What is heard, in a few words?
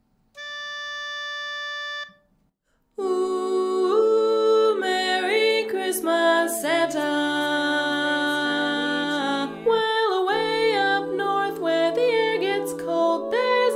lead